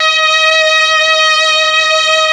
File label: STR, M400; ILIO; Propeller Island Legendary M400